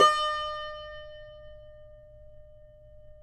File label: CUMBUS D#, Guitars; Spectrasonics; Hans Zimmer Guitars VOL-2